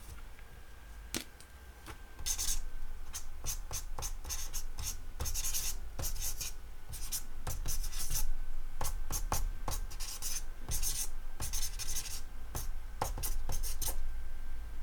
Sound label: Drawing With A Marker